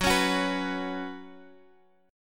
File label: F sharp Suspended 4th, Guitar, Half Step Down